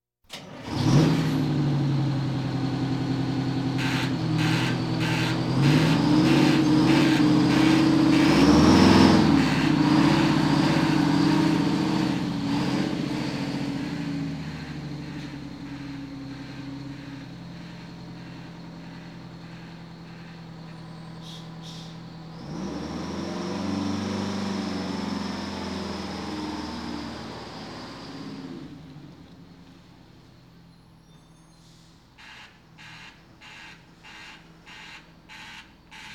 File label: Police, Fire engines